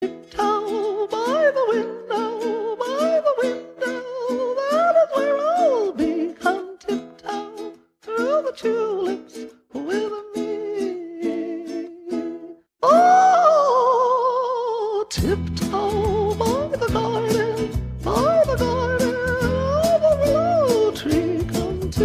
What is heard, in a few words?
cartoon tip toe